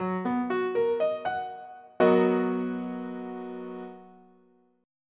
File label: B MAJ7